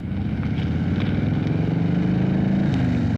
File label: Motor Cycle